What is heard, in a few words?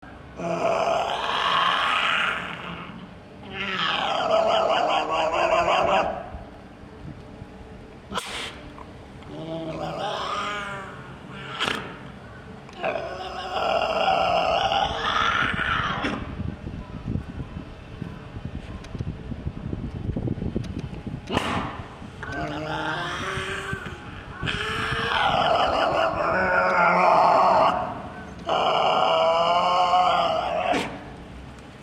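tasmanian devil, タスマニアデビル 鳴き声 唸るような特徴的な大きな声; 多摩動物公園; 唸るような特徴的な大きな声を立てます。この恐ろしげな声ゆえにデビル（悪魔）と形容されたとも言われます。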